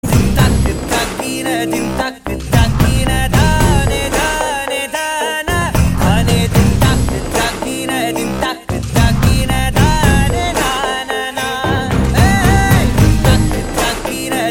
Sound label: Humming Ringtone